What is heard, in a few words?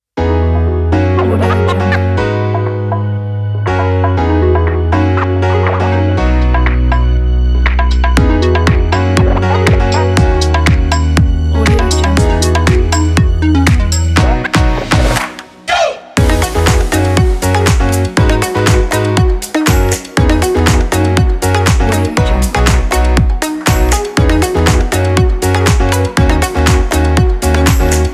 tropical house